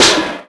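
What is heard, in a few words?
bouncy metal trash hold hit; bouncyobjects; Tony Hawk's Project 8; XBOX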